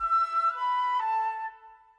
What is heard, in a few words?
pretty orchestra flute melody